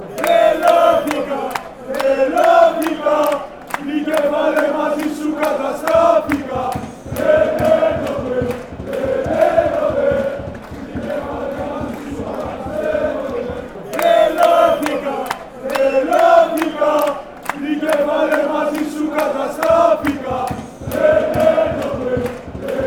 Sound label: aek athens chant